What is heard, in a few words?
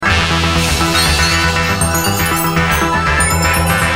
ringtone